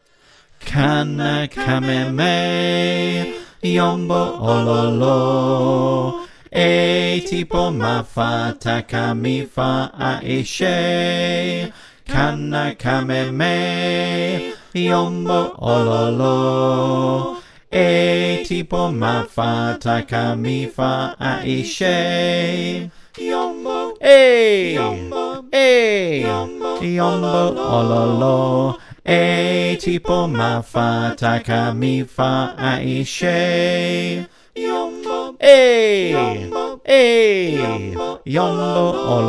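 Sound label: bass, choir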